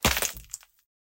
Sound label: Debris Impact; SoundsCrate